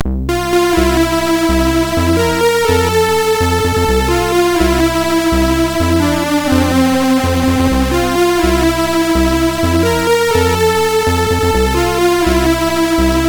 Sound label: Jingle 2 Breakdown, sid MOS6581R4